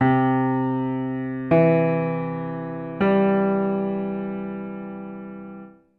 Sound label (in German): dur arp